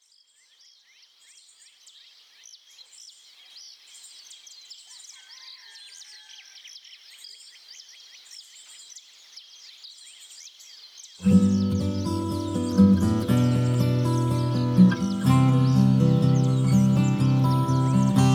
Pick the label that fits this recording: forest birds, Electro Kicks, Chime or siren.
forest birds